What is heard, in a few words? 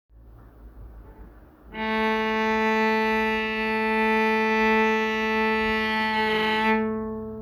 cello a3; tuner